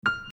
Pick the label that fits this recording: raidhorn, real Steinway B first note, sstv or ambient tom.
real Steinway B first note